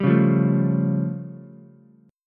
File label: A Augmented 7th A, Ukulele; strummed; JGuitar